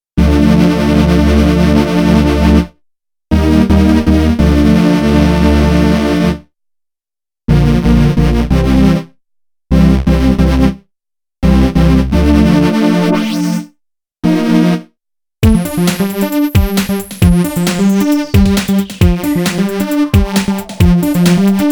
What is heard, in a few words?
Noize Bass, Korg Radias-R